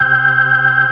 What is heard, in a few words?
B3 C 3; B3 LES SM